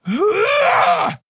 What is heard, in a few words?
Female Quilboar Attack